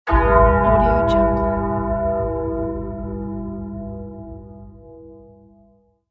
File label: church bell